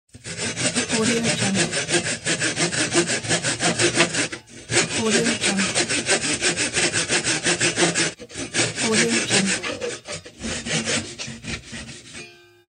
wood saw

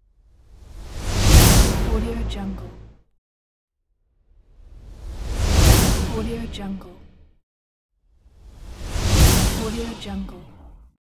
epic transition and movement

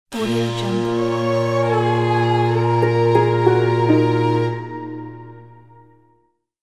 chinese lost stinger

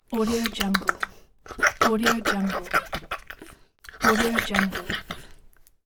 cartoon eating

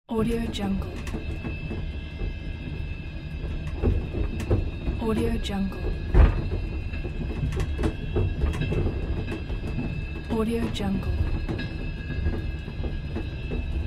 train sound inside the carriage

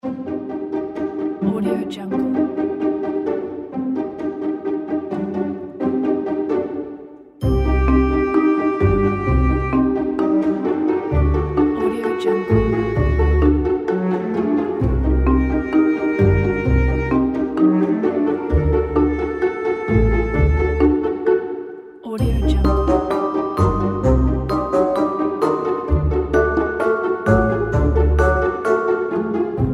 for funny music; juiceanna

